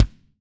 BD FFF L, KICK; Living Drums; Sampleheads; Peter Erskine; PB KICK+TOMS

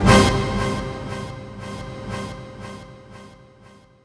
ORCHFX, orchestra, Asian Traditions

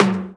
Percussions

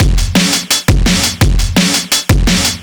Beat Mooger 170, distorted drum loops 170bpm; musicradar

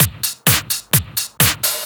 Straight Loop 128 BPM, House

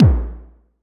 kick verb

